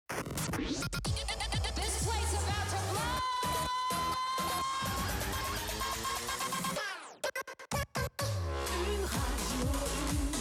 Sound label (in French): Jingle Chanté; RTVFM; mac do